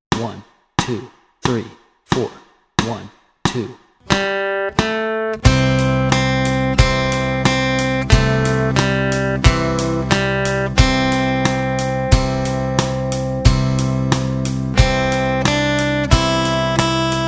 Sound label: Shell Be Comin Round the Mountain Melody and Chords Track, guitar